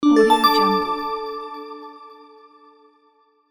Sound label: bells notification